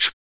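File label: vox percussion, Vocal Planet Jazz FX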